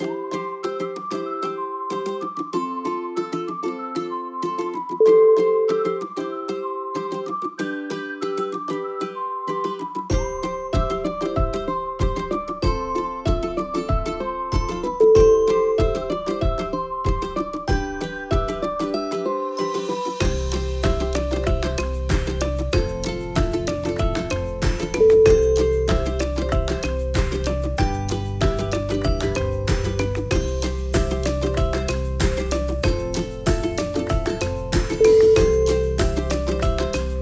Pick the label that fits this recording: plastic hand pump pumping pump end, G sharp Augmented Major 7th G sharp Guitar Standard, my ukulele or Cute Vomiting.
my ukulele